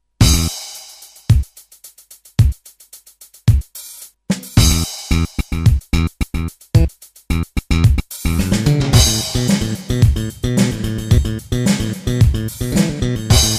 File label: disco